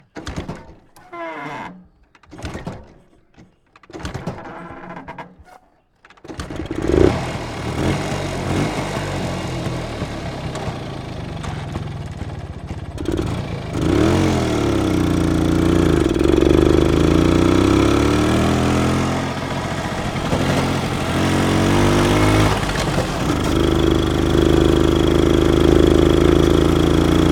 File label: Rajdoot, motorcycle, ride, engine POV, Yamaha Rajdoot 175cc; Bombay Sonic